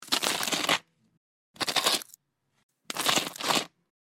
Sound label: Toast butter spread butter knife